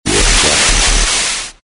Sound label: static blast